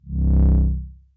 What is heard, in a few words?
powerup; Incantation